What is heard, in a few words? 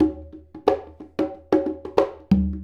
Congas; Essential Percussion